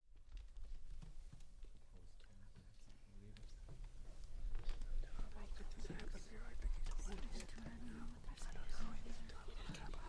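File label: Crowd 12 Presence Light, small interior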